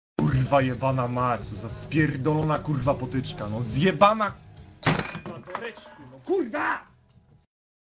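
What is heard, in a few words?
jingle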